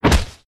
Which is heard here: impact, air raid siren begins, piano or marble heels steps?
impact